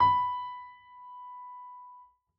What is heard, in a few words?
piano b; Golden Crayon Sounds